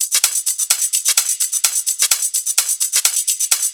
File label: Shaker Loop, 128 BPM; Vengeance Samples